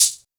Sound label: Techno Shaker, Drumset; Roland SH-32; Drumset 03